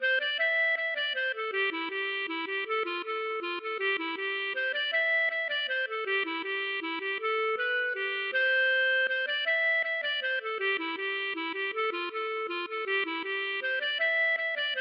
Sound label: mazurka